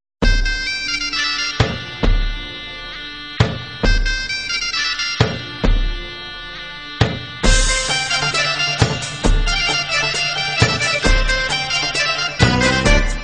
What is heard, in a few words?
Kolo Mix